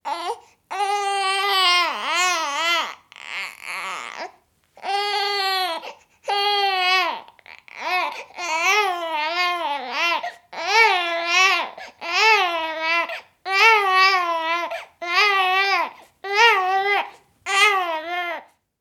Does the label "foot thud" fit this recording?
No